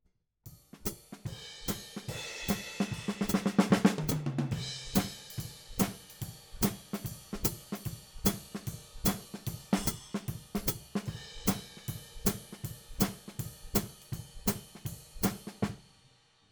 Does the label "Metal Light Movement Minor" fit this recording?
No